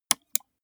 gaming mouse left click single

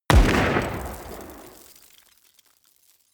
explosion medium dirt fall; explosionen; comedy-cartoon; soundpool

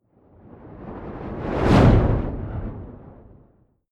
fire whoosh big; swooshes-swishes

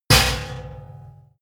impact metal special; soundpool

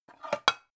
pan take slide short; objekte; topf

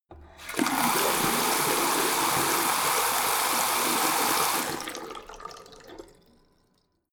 tap flow hard short; haus garten; badezimmer

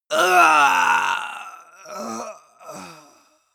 male combat die; schreie; menschen

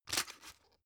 drug put in hard; berufe; arzt

